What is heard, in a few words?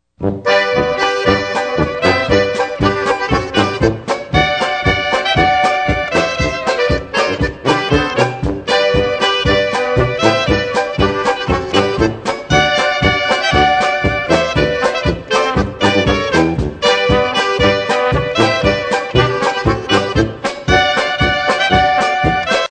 polka